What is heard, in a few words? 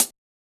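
Hi-Hat Closed; Yamaha DJX-2B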